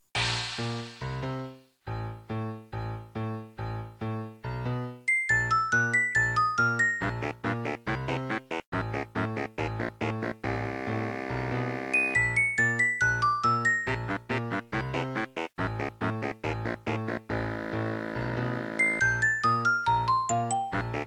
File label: Battle Theme; P2 Giant Breadbug